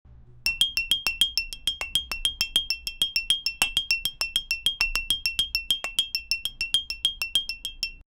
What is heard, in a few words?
jingle, corporate; vale concursos